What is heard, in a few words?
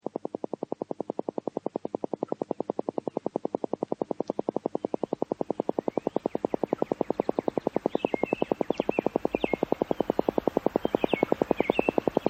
rainforest